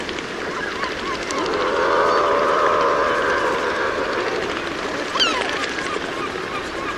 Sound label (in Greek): Thalassokorakas; skyros